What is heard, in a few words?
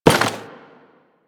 Worker Step Default; Solaris; SolarisWorker; Steps; Warframe